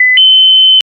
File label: beepbeepbeepbeep, alarms